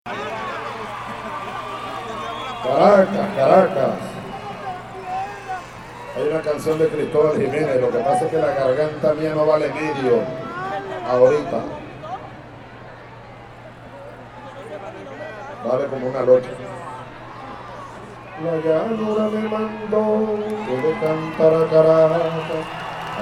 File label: sings; Chavez